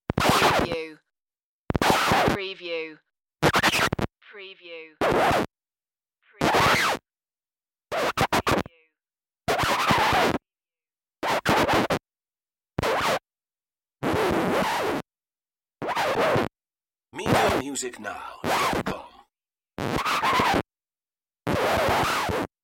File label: vinyl needle scratches